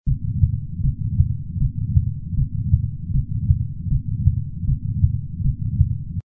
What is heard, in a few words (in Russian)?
Sercebienie cherez stetoskop